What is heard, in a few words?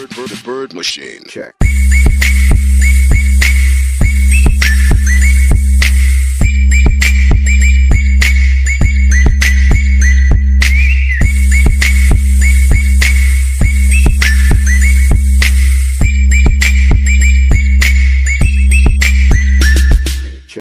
jingle bell funny ringtone